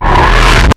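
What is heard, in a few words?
Boss DR-202 percussion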